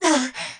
Hit

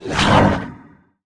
Death

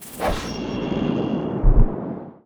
sylph wind

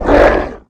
Attack Voice

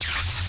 ELEC

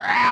hit trogl

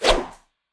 Whoosh

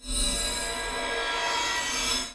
Effect Magic Burf

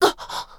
Hit Voice

